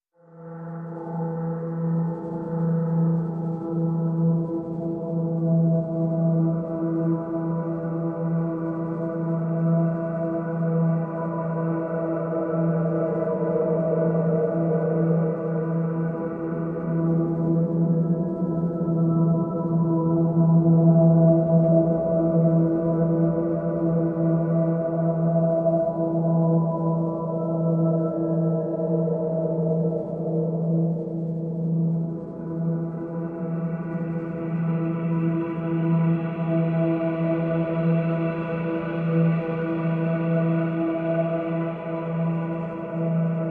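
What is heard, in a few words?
Drone E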